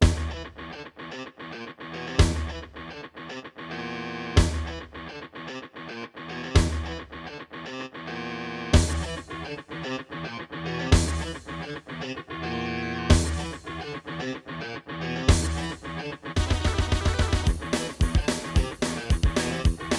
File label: ensoniq elite; Ensoniq Sounscape Elite; soundcard test; Doom